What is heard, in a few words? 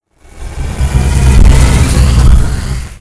jump out; endless sky